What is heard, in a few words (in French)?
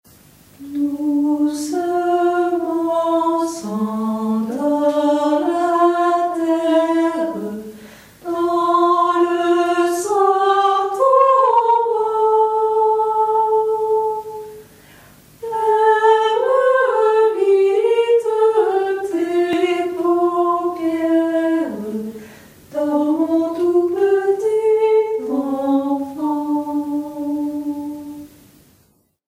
Berceuse cosaque Soprano